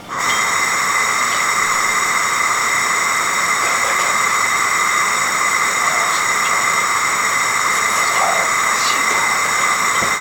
Gaz Long

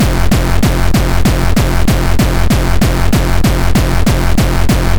Gabber kicks

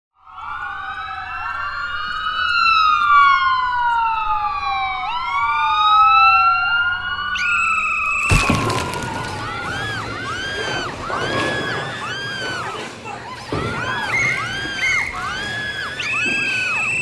Police Raid And Wind

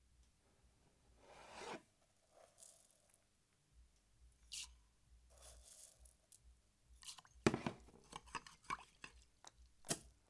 Sugar Thrown Into Coffee

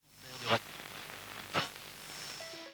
Radio Inaudible

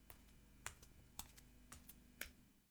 Walking Along A Smooth Hard Floor Barefoot

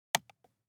Switch Light

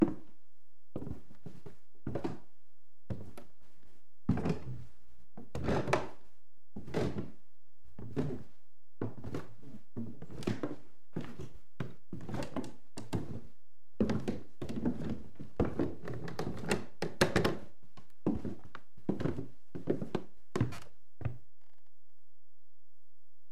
Wooden Stairs Creaky

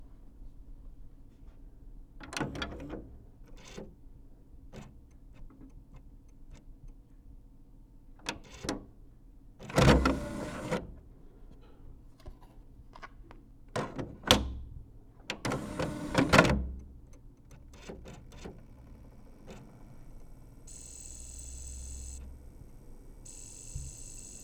Putting In DVD

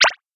Beeps

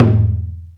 Taiko Open

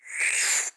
Zoom Out

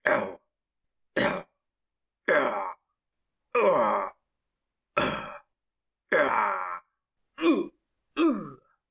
Cartoon Style Male Pain And Hit Vocal

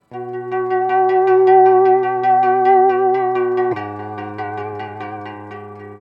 Trem Melo

